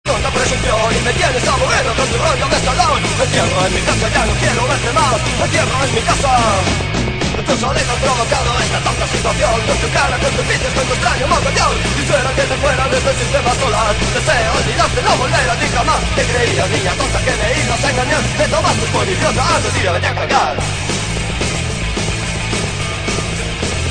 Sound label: BEAT